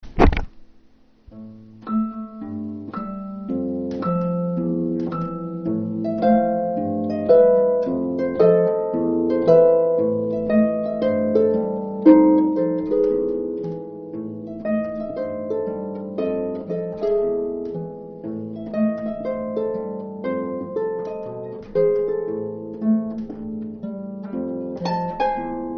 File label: Boogie Man Blues with scream, piano